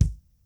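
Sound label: bass drum, acoustic set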